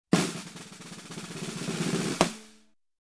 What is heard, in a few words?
Snare Roll Tension Build